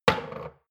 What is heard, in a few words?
shot glass place table hard wobble; soundpool